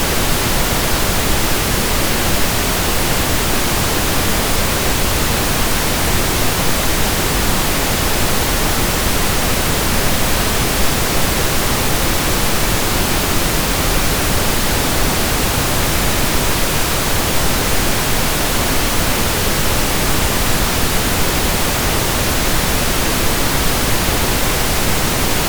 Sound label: Pink Noise; 24 Bit / 192 kHz